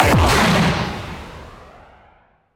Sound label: Fire Missile